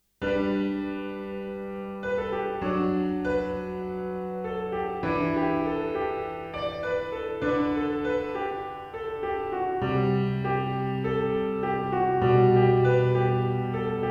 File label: piano pop